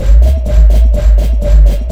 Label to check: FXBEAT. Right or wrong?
Right